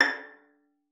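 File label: violin pizzicato A5; Xsample VOL-5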